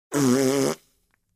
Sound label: fart sound